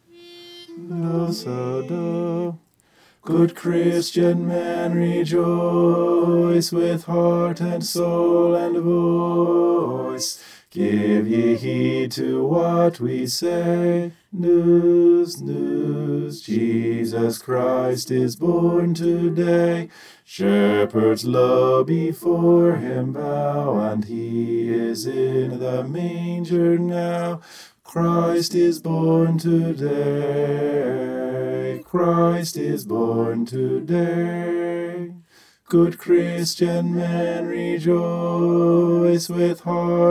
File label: good christian men bass